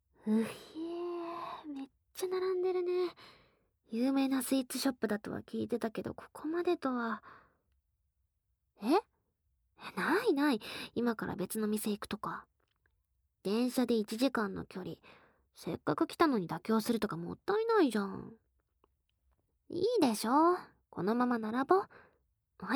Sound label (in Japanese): 行列ができるお店の最後尾で人混み耳舐め; 耳が敏感な体質のせいで、女友達が耳の中をイジってくる; バイノーラル